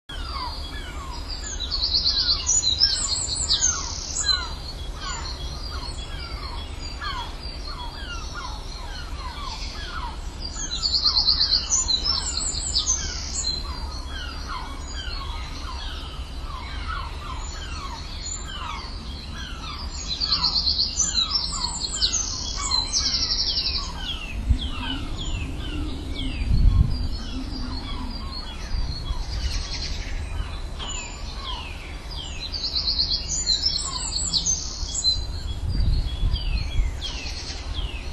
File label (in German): Cux voegel